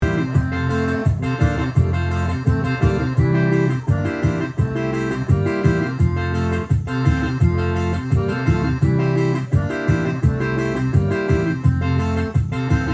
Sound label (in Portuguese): teclados